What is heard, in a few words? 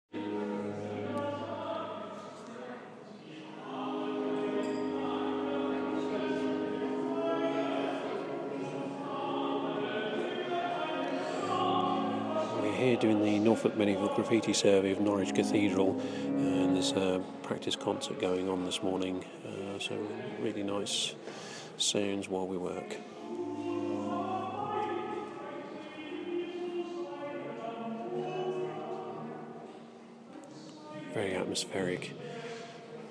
sounds of the cathedral